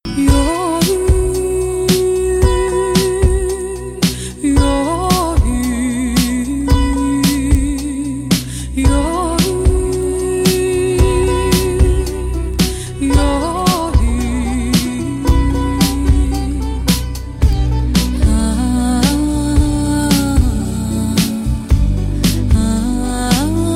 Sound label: bg humming tone; tamil; yennai arindhaal